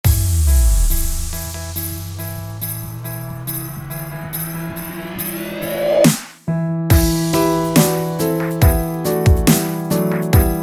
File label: DUBSTEP